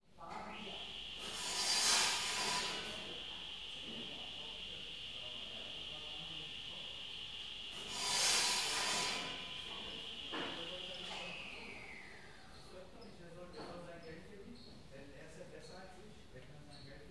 BERLIN HARDWARE STORE WOOD SHOP AREA CIRCULAR SAW 2 TIMES MID DISTANT VOICES; Creative Audio Pool; URBAN BERLIN VOL. 1